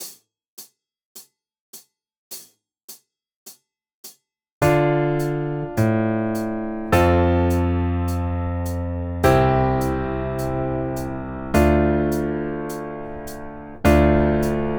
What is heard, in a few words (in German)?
Etuede d moll